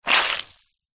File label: foley wet clothing squelch; zapsplat